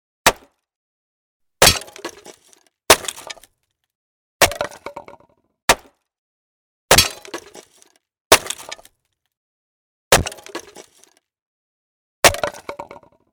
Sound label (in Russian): Toporom rubyat